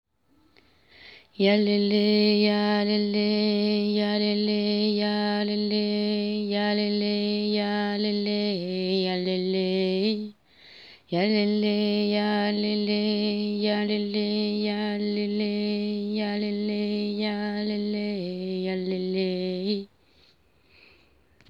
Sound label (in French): Bourdon basse